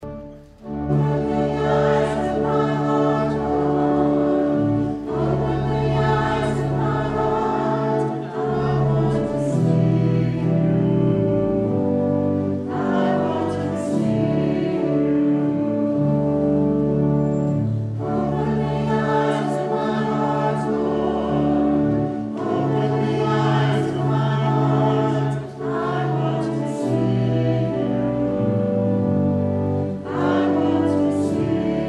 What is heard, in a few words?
Anthem All Church Choir